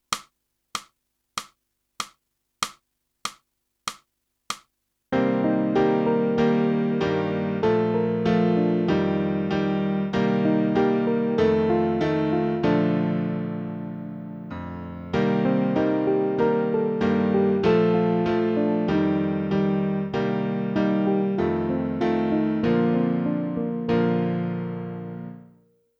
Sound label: sax